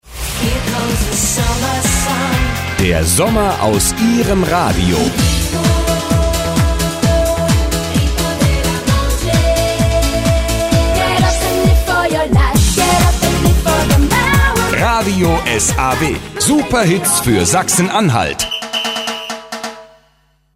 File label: saw hook